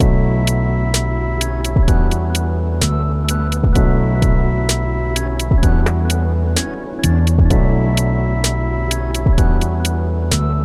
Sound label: Futuristic Retro Tavern